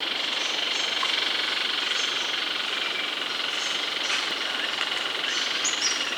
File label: Cichlocolaptes leucophrus leucophrus rattle after play-back; Andrew Whittaker